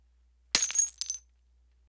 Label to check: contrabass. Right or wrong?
Wrong